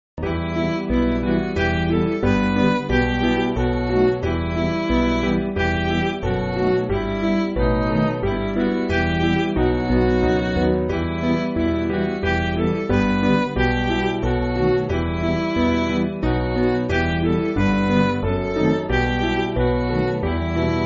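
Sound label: Midi, Music Backing Files for Churches; Aberystwyth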